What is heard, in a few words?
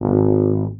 TUBA STRA G#0, Tuba Straight; Orchestral; DirectWave; Varazdin Orchestral